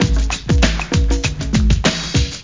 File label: breakbeat; World of Sound; instruments; beats